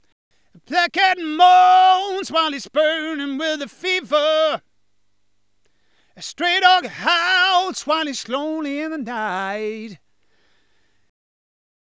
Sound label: Rock Soul Pop Dance band